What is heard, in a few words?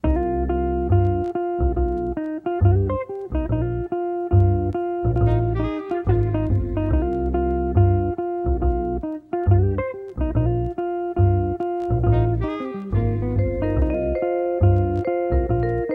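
Shop C minor 70bpm Sax add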